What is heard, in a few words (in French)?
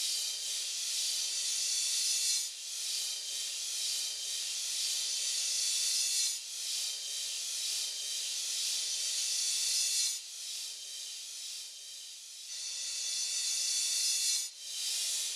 lames et reverse